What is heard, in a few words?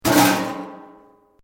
METAL BOX; Metal (Sound Effects:Materials)